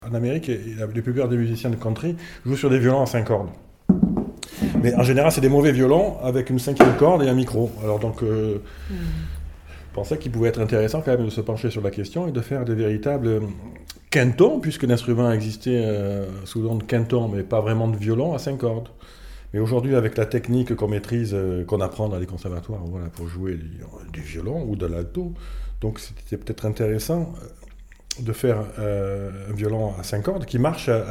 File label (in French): Violon à 5 cordes